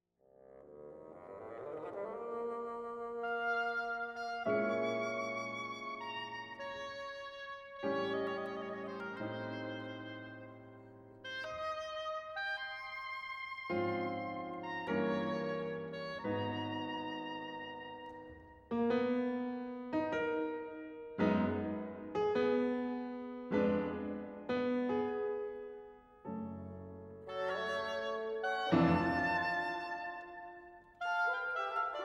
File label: piano wind; poulenc